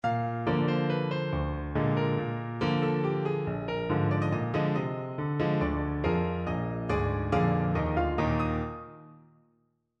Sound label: eb major, Elaborate Cadences; dressed up perfect cadence; For this project I was asked to write four examples of a dressed up perfect cadence.